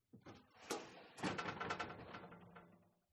Door Appliance Oven Open; uberduo

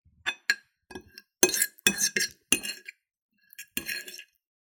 food stir fry scrape onto fork plate; zapsplat

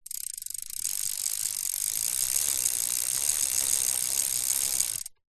bicycle pedal backwards wheel hub clicks; vehicles

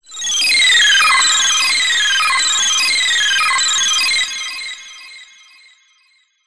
sound design data beeps delayed synth; zapsplat

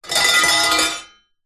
impacts metal hollow pieces drop

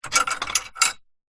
household gate wooden metal latch unlock open

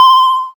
ping whine; sound design

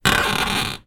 household drain pipe bathroom stand on creak; zapsplat

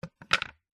sport cricket bat set down on hard ground; zapsplat

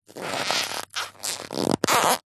leather wallet bend stress creak

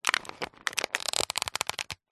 plastic packet tough handling movements squeezes; foley

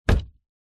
household wardrobe door open freestanding wooden

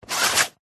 tin very small circular lid on spin short; foley; zapsplat

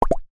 bubble pop

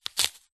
food apple knife twist crush split

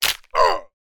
horror bone arm leg break male scream; sound effects one

